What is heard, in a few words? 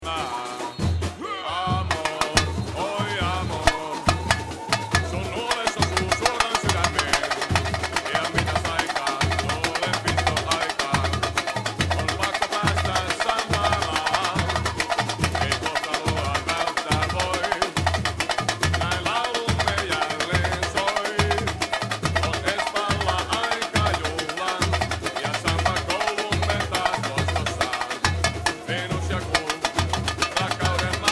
Amor ja Samba tam, bateria